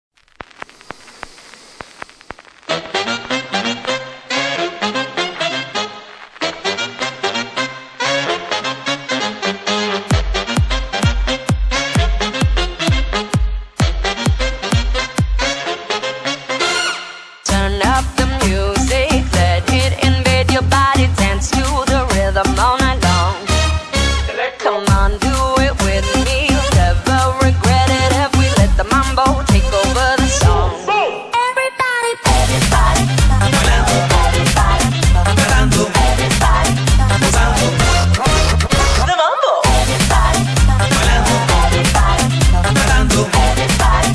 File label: Electro Mambo; Just Dance 2016